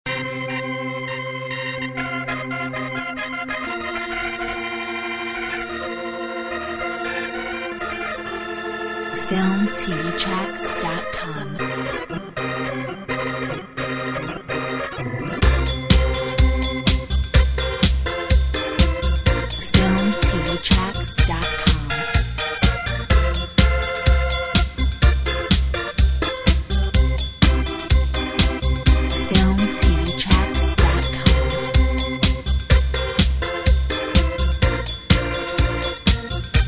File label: Gospel groove